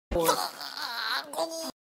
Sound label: AGHHHH